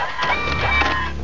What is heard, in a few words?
swing out; carma